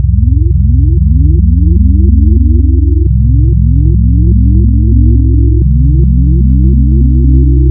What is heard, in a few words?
Add Partials 4N, wavetables Additive; Architecture 32